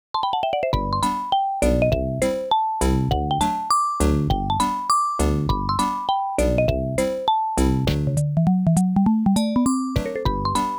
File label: Comical Music; Amagami